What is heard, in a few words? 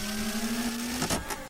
robot in; jetpackLearningPlatform; crack-the-code